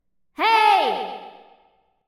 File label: ghost